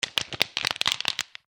骨折

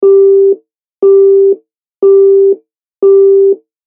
電話 つながらない

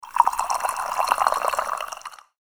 飲み物 を 注ぐ

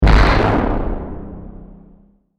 爆発

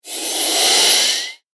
近未来動作音

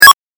8bit 選択

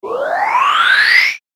上昇

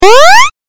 8bit ジャンプ

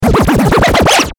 スクラッチ

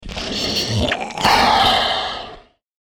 クリーチャーボイス

